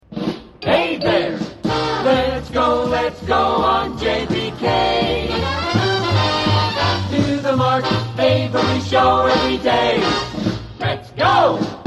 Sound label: Show Jingle; WJBK-AM